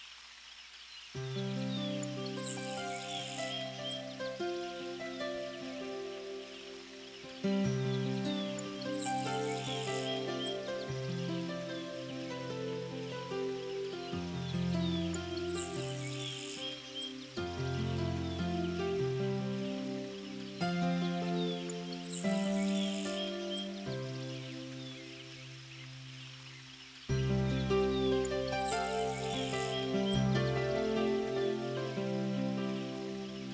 Songbirds, Mountain Paradise; The Sounds Of Nature

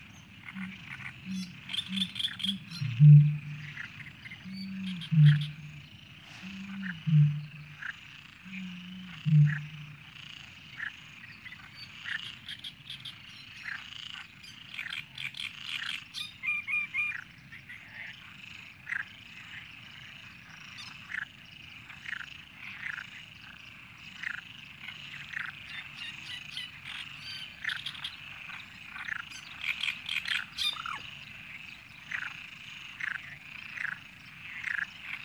Frogs, Great Marsh Warblers Coots

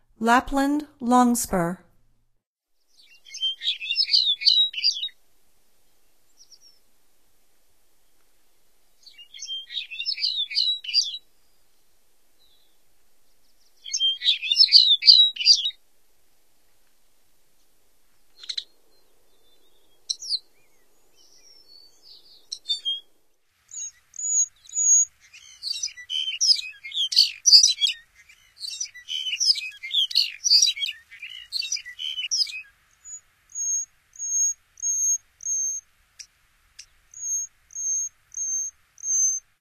Lapland Longspur, Songs of Birds of North America; Stokes

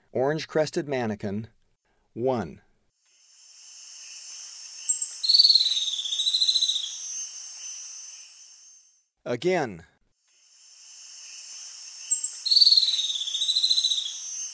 Orange-crested Manakin; S. America; Eastern Lowlands

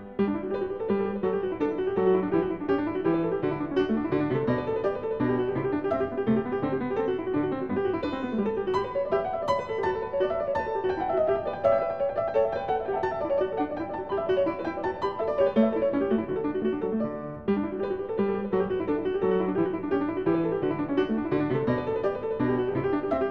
Variatio 5 a 1 ovvero 2 Clav., Piano; JS Bach - Goldberg Variations

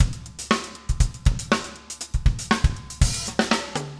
funk, 120bpm, loops

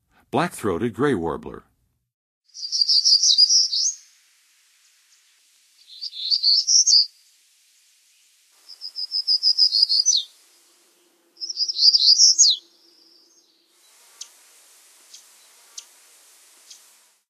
Black-throated Gray Warbler; North America; Western USA; Western USA Songbirds

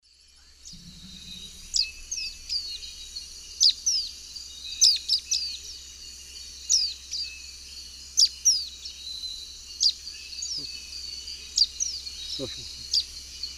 Myiozetetes similis, dawn song; S. America; Eastern Lowlands

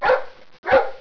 DOG, animal

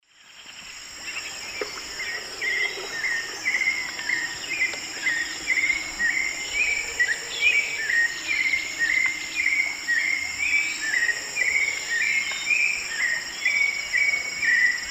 Turdus hauxwelli, song; S. America; Eastern Lowlands

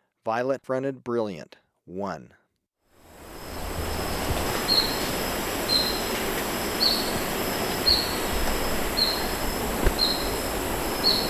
Violet-Fronted Brilliant; S. America

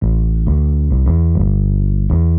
X Bass, Rock Bass

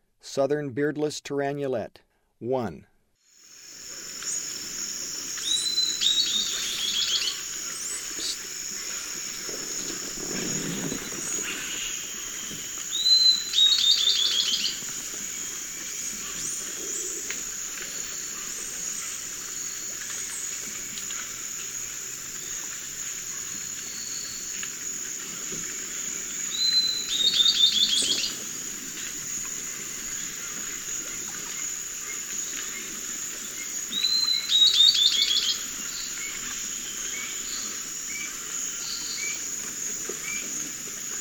Southern Beardless-Tyrannulet; S. America; Eastern Lowlands

